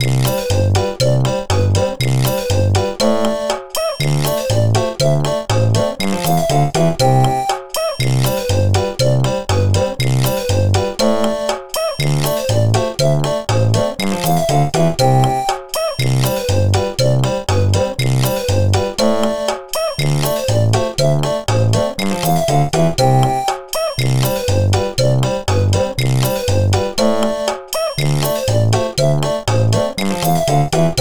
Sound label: Bouncy Fun